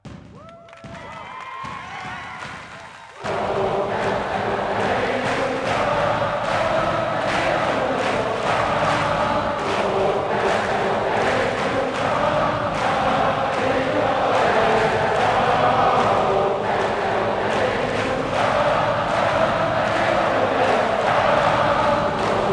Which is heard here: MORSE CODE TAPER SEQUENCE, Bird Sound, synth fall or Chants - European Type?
Chants - European Type